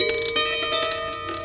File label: Prepared Piano; Battery 3 Sample Kits